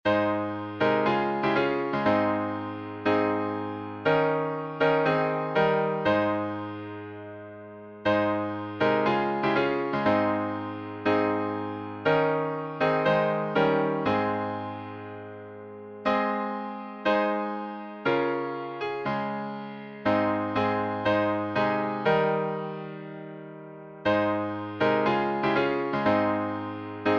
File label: Hymns